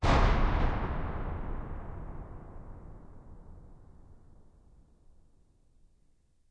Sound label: corridor, undeadtower